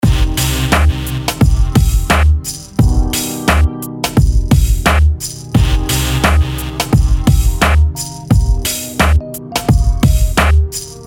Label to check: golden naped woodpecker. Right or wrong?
Wrong